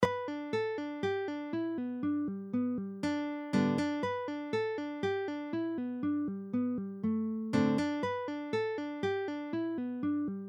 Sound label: sounds like jay, banjo